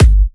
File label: 130BPM Jig Kick; Vengeance Samples; DK Jig Kit